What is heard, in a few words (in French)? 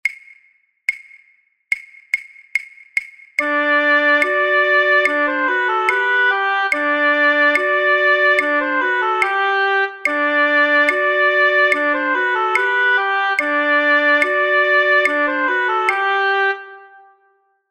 perc phrase b lent; Gavot